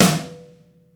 sizzly acoustic snare sample e key, Royality free snare single hit tuned to the E note